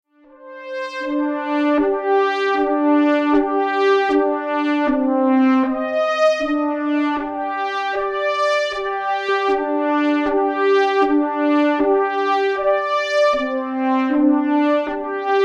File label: subtle chorus ob8 brass modes 1 to 6